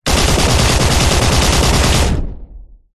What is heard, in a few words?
warfare machine gun powerful burst designed